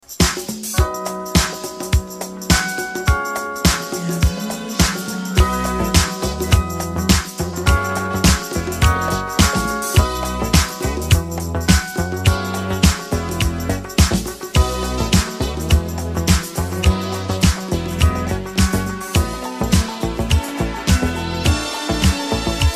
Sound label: 80s Mix